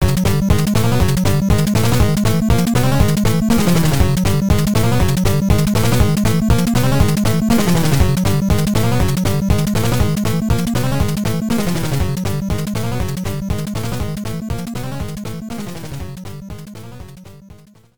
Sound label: Underwater PANIC; Teenage Mutant Ninja Turtles; NES